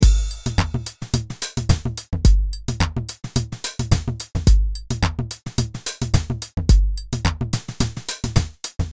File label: beat